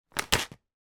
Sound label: food crisp potato chip remove from packet; zapsplat